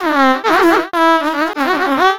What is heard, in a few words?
Hurt Voice; Walter